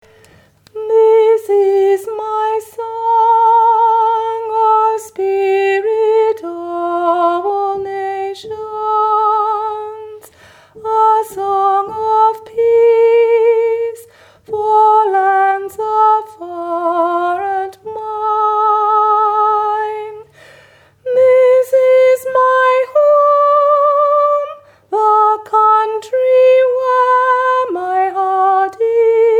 Finlandia Sops

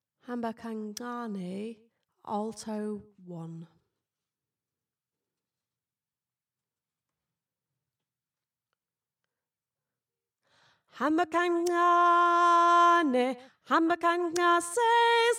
Alto; hamba ka ncane

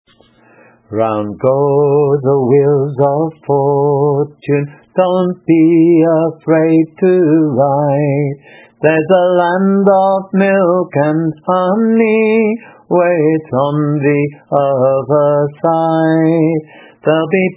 Rolling Home Tenor

